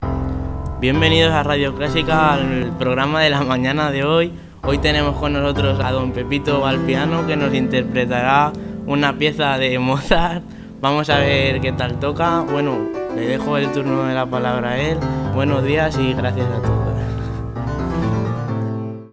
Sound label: piano